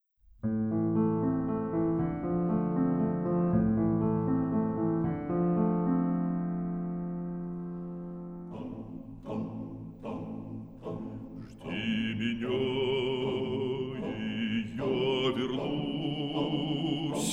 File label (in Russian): Хор Валаамского монастыря